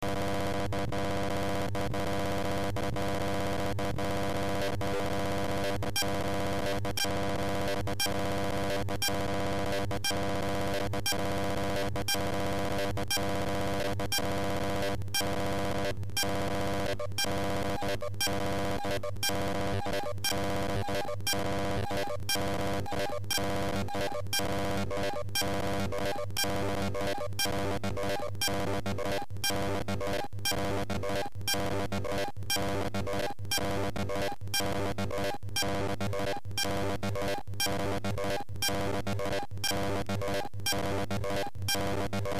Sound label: drones